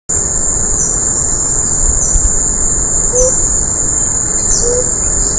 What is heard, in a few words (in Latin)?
Claravis pretiosa